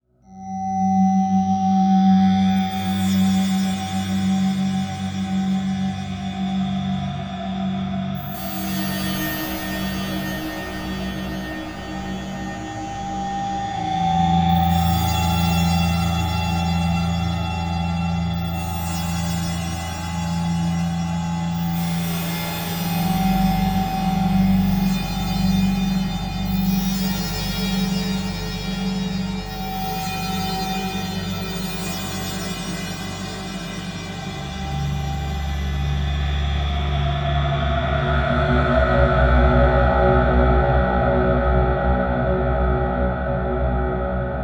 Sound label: Haunted Dream Drone; Sonic Foundry (Sony Creative Software); Numina II